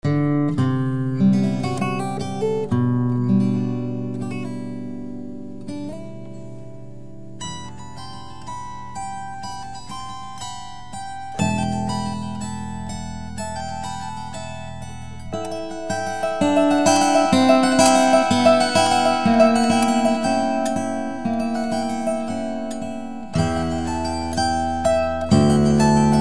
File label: harp